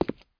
walk; MOTS

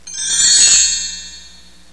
house sfx; Listings Deluxe - Select Street Atlas 1.0

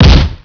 Blast; DisEase 3.0; Attack⁄DoS

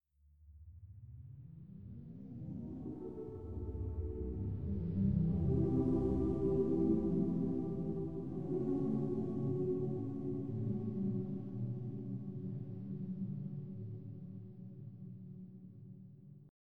space voices, Choir

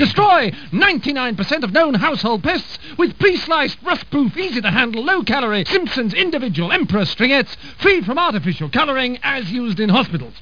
string

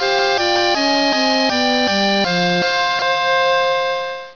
Music; Pyramid Solitaire

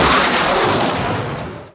shoot; PC Gamer IT CD 48; Big bang

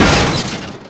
crash met

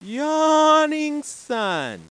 yawn sun; My Science Fair

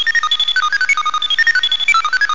computer sounds

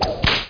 banshee

